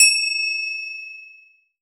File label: FINGER CYM; AKAI S-Series CD-ROM Sound Library VOL-5